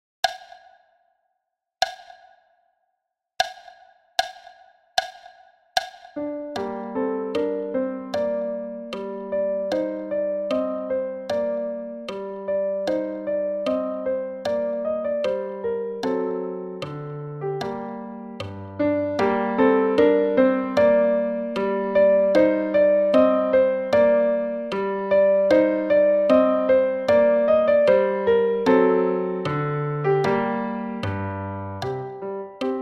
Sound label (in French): Vieille chanson francaise 76 bpm